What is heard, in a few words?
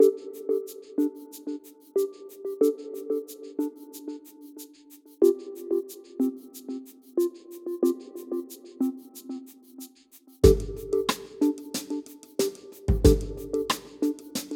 Downtown Stroll 92bpm